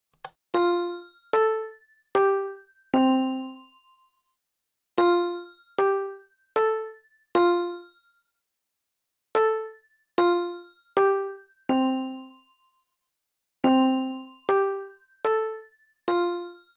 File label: Japanese school bell